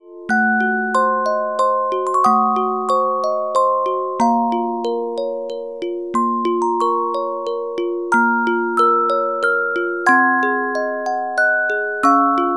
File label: Carillon Music Box Melodies